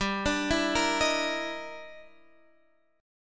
G Minor 6th Flat 13th G

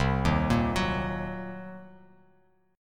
C Minor 7th Flat 5th, Bass Standard; arpeggiated; JGuitar

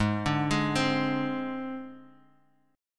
Ab Major Flat 5th Ab; AbMb5 arpeggiated; JGuitar

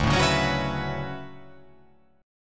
D Major 13th D down

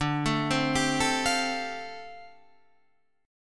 F 6th Add 9th D, chord

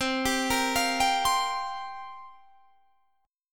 C 7th Suspended 4th, guitar; C7sus4 arpeggiated

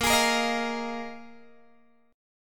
Bb 7th Suspended 4th Bb Guitar Open G

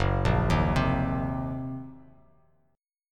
F sharp 6th Flat 5th F sharp down Bass Standard; arpeggiated; JGuitar

